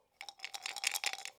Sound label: dice movement